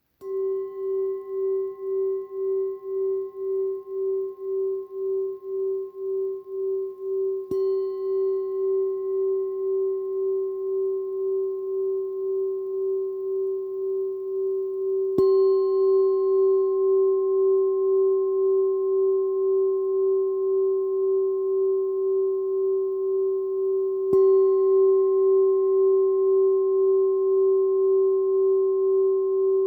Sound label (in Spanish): Sol, cuencos tibetanos